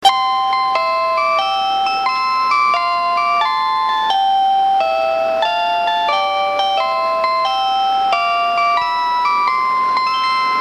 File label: train chime; スーパー白鳥; ７８９系のチャイムです。; 終着駅到着前のみチャイム６が流れます。; 鉄道唱歌